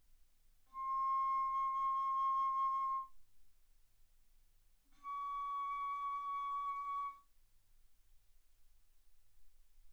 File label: Bass Flute C6 Db6; ff